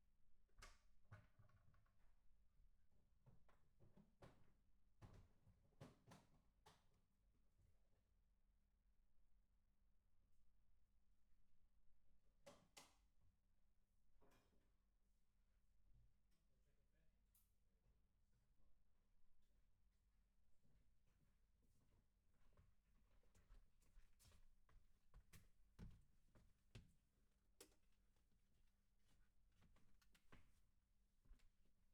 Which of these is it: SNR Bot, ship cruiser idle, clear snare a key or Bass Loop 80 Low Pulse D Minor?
SNR Bot